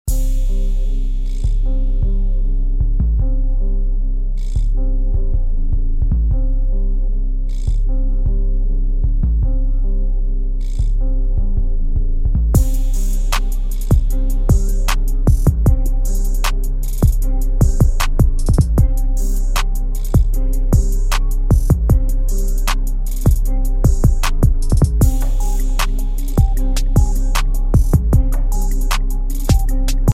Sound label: 154 bpm